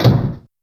Door; Yamaha DTXpress; Effect 1

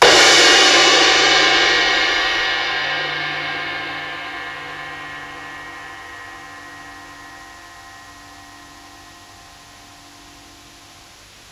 Crash, Psychedelic Drums

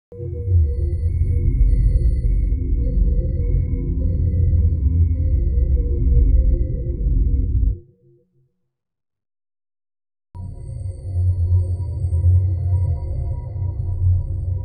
Deep Atmo C1 C2 C3; FX & Atmo; Klaus Schulze Collection

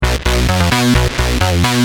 Bass, Industrial

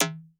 Perc Reso, Vermona Mono15 Drums; Stereo layers

